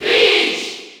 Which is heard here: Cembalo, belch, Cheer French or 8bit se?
Cheer French